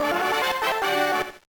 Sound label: Jingle, gba; Mahou Sensei Negima Private Lesson 2 Ojamashimasuu Parasite de Chuu